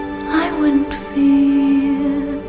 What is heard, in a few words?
female laugh